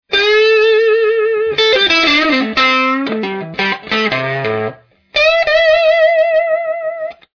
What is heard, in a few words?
distort